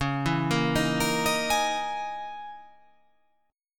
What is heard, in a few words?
C sharp Minor 6th Add 9th C sharp